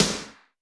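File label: Snare Slap It, Alesis D4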